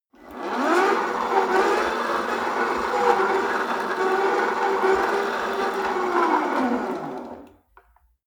rope trainer pull sequence medium long, Fitnessstudio; sport freizeit